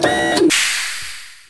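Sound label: sonic deactivate; zaero; weapons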